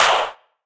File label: Clap